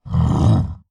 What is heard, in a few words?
kagouti attack; skywind